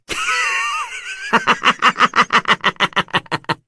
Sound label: laugh